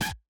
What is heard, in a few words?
short wub F, bass